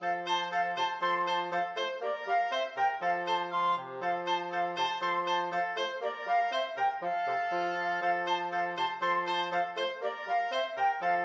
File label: ecossaise, flexible mixed ensemble 4 players